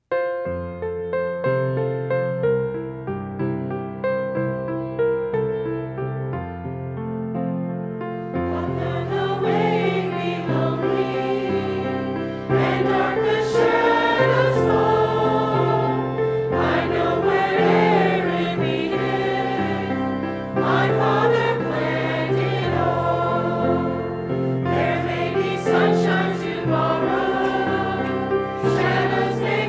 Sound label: Choir; calvaryministries